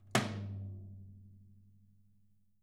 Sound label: toms, drums; Sampleheads; Peter Erskine